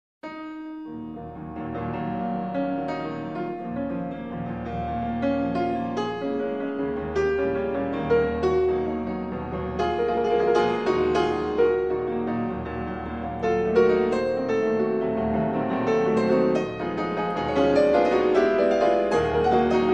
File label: Non Allegro - Presto in E-Flat Minor; Études-Tableaux, Op. 33 No. 5